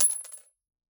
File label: Coin Drop Concrete Floor